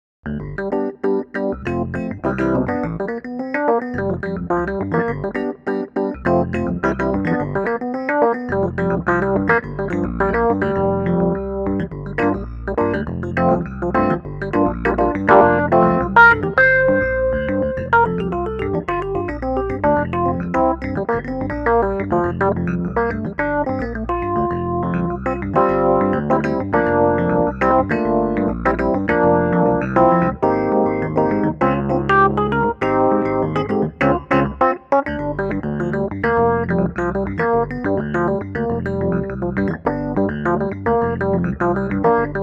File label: Funky